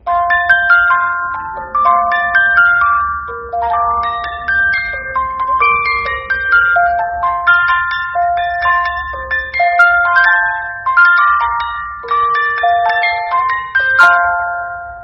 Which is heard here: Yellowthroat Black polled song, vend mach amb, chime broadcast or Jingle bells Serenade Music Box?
Jingle bells Serenade Music Box